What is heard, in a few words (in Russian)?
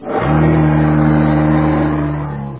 zvuki losya v estestvennoj srede obitaniya